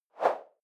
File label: whoosh simple short airy high